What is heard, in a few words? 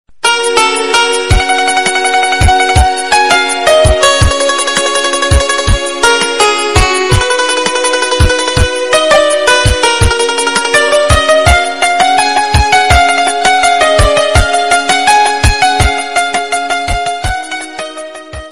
desh bhakti instrumental ringtone ringtone song